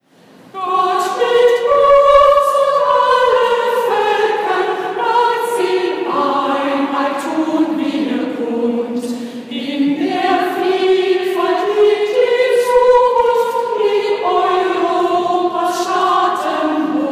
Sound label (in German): chor bayernhymne